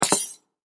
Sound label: Footsteps Cowboy Boot Spurs Single Right Soft